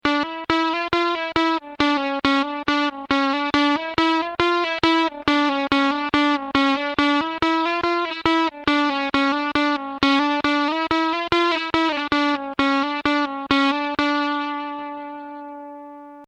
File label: jawari